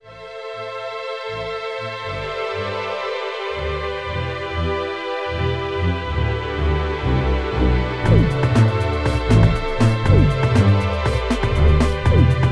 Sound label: string beat bedroom